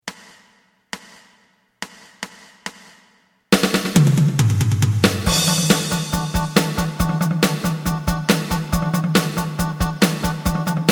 bass

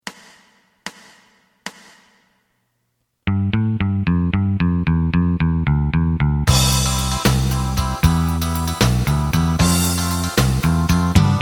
lead

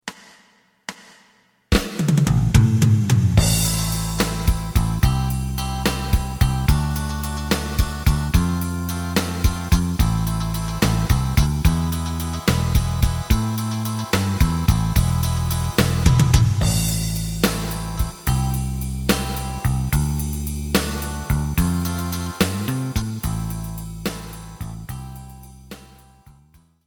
lead; エレキバンド用カラオケCD

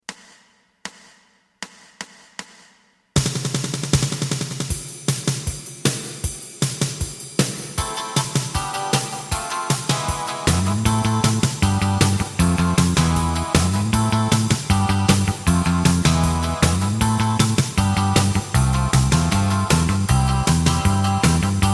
lead; エレキバンド用カラオケ

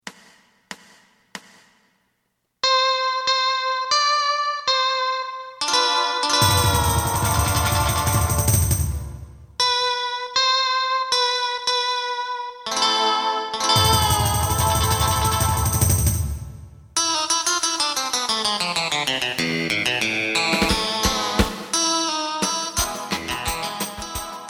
bass

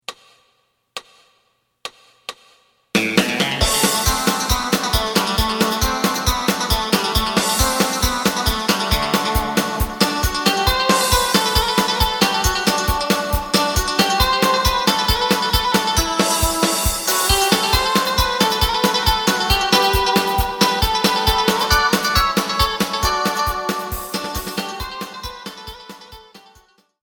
bass

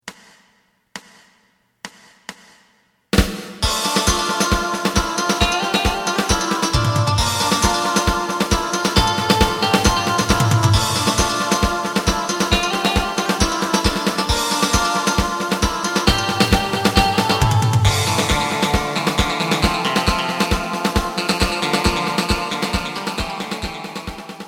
bass

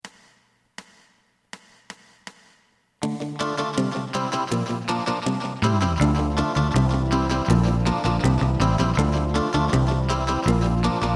drum

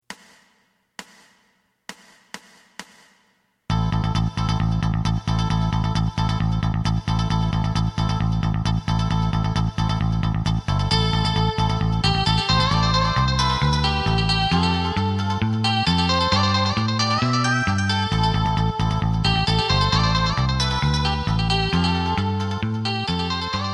drum

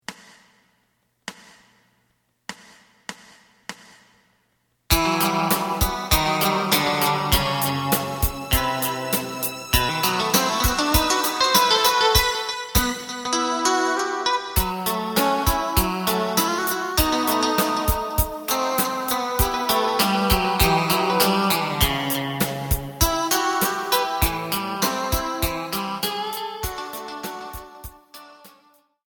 bass

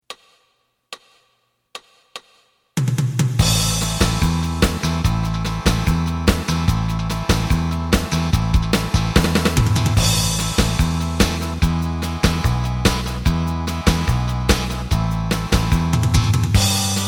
lead

